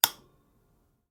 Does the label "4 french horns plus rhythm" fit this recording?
No